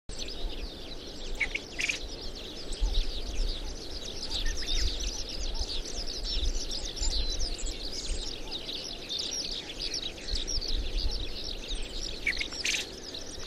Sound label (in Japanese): uzura